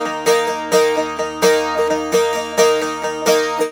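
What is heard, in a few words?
D#, bouzouki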